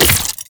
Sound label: Sentient Rifle Mech; Warframe; New War